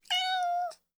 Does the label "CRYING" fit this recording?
No